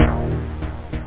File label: italo, rave; da capo vol1